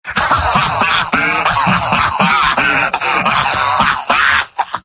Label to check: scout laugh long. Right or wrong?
Right